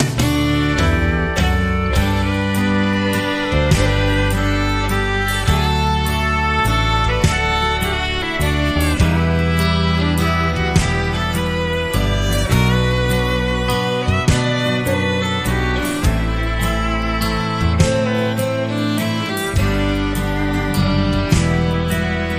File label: Sunset Waltz